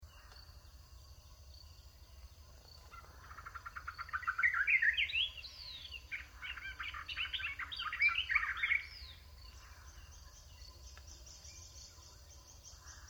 Nature Forest Salvana Grassland Song Birds Cicadas Wildlife Kenya Africa; Bird Sound Effects